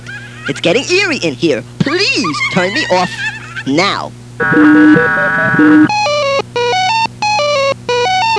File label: eerie w beep